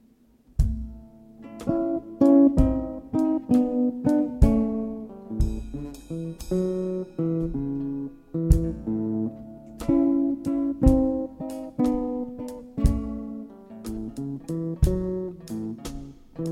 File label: blues; Musique Rois 2011